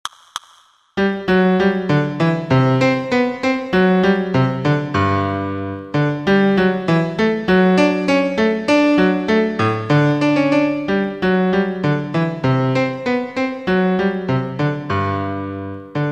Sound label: bourree karaoke slow; handel